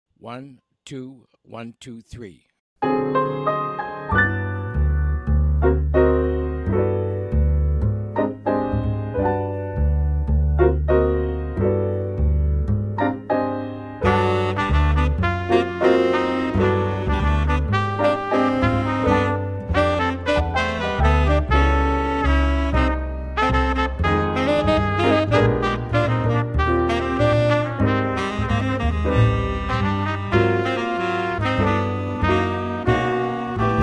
minus drums

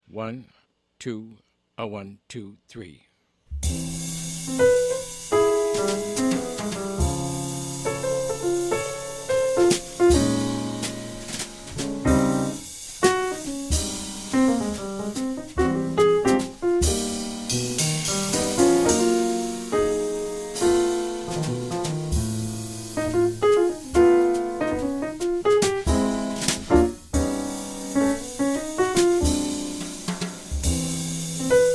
slower minus bass

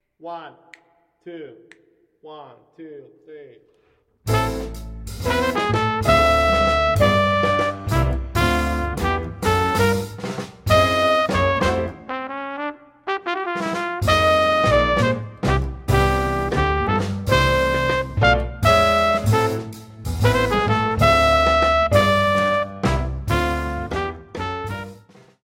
minus tenor

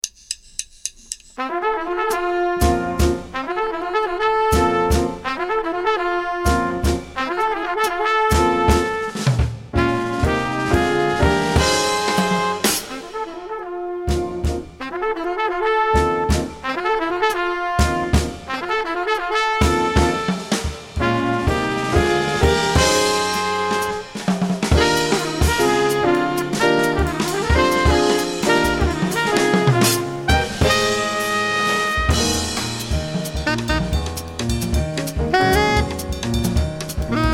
minus Bass

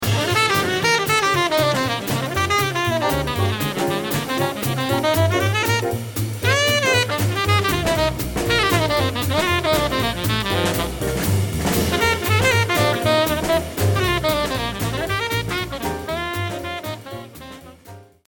solo

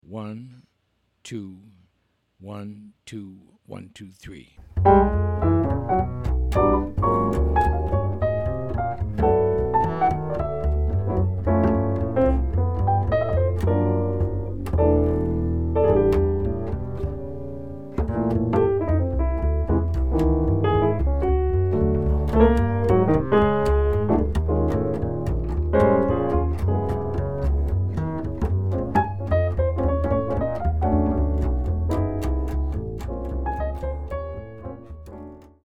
minus Drums

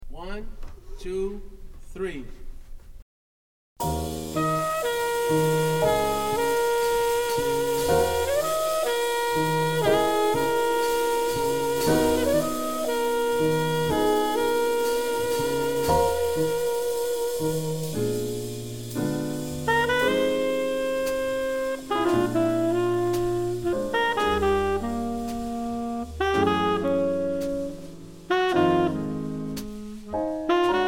minus bass

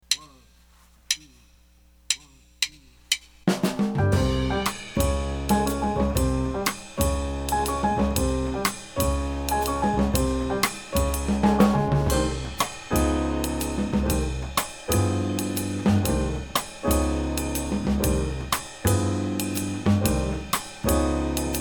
minus Melody

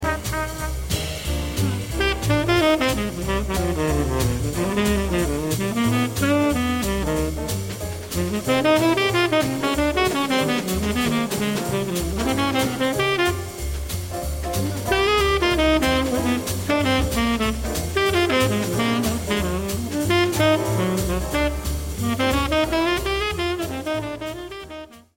solo

